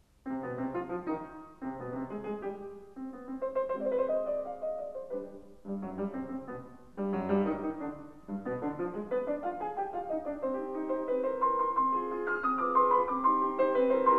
Piano Sonata No. 5 in C minor; Wilhelm Kempff; Beethoven; Piano Sonatas

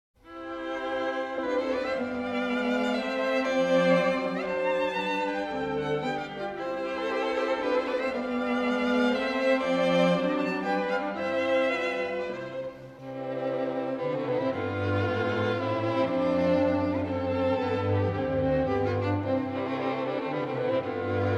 String Quartet in C Major, Menuetto; String Quartet No. 9; Op. 59 No. 3; Razumovsky; Grazioso; Ludwig van Beethoven; Beethoven - String Quartets Nos. 1-16

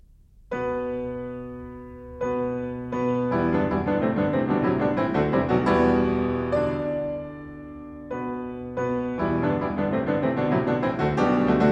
Prelude in E minor, piano; 24 Preludes

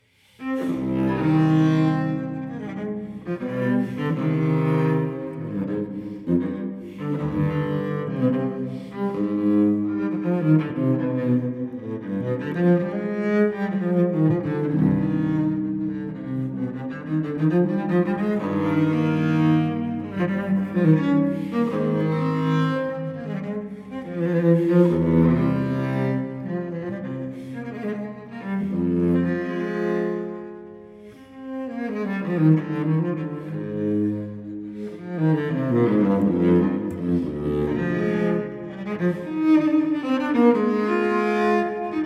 Cello Suite No. 5 in C minor Allemande; BWV1011; Johann Sebastian Bach

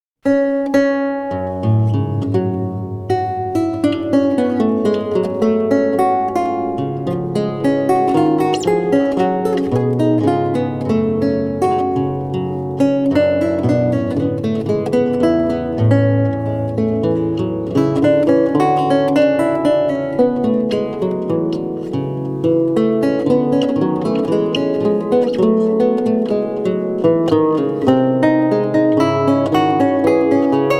Lute Suite in E Minor, Allemande; BWV 996 - II.; Bach; JS Bach - Complete Music for Lute

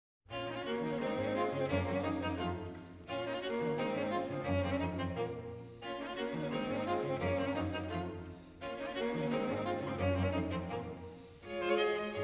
String Quartet in B-Flat Major, Presto; String Quartet No. 13; Op. 130; Ludwig van Beethoven; Beethoven - String Quartets Nos. 1-16

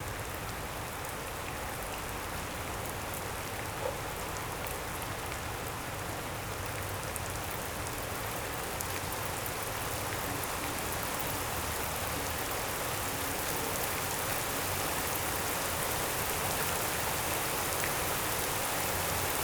Hail, Field Recordings Oregon Rain; Omni Gardens

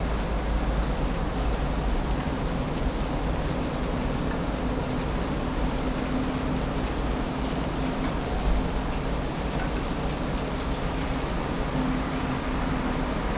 Snow & Wind, Field Recordings Oregon; Oregon Rain; Omni Gardens

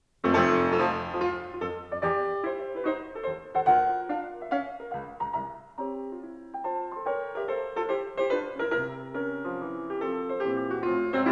Piano Sonata No. 28 in A major; Wilhelm Kempff; Beethoven; Piano Sonatas

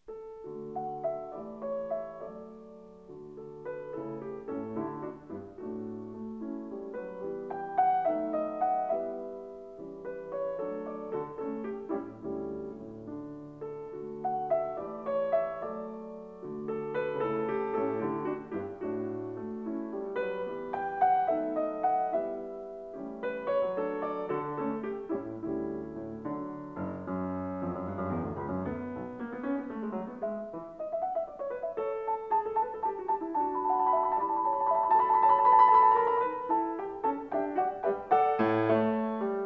Piano Sonata No. 7 in D major; Wilhelm Kempff; Beethoven; Piano Sonatas

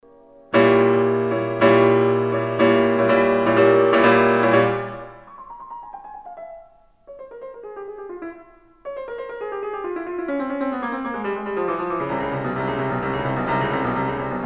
Piano Sonata No. 23 in F minor; Wilhelm Kempff; Beethoven; Piano Sonatas

